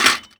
stall, BMX; Matt Hoffman's Pro BMX 2; XBOX

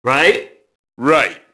mobster group noise; Max Payne

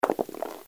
dice roll; craps

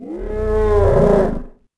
Whine, Dino

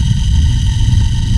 drone loop, ships engine; Quantum Redshift; jaya

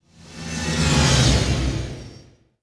fighter droid passby

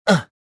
jump

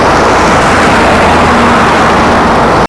Car passing by; cars; Max Payne

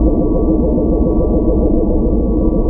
UFO LOOP; BedRoom

